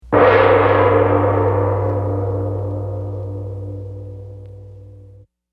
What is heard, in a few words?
Gong Single; Gong Sound Effects; Musical